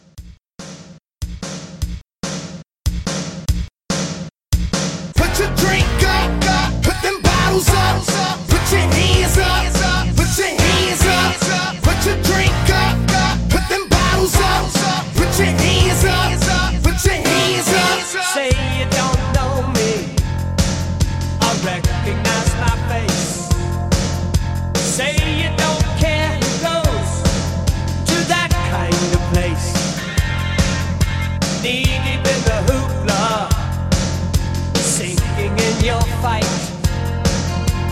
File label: 80s 144; 80s Hype Edit